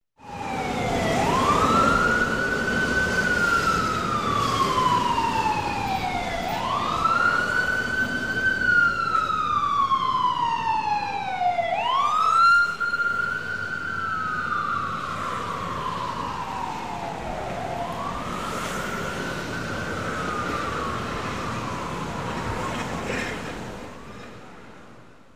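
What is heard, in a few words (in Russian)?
звук сирены полиции в городском трафике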